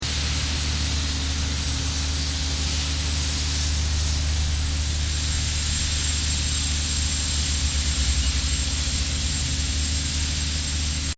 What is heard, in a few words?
Industrial Aircon Operation Loop, Hospital aiconditioning plant; Machinery; Machines